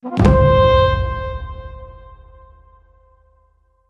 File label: Jingle Start; Ape Quest; PSP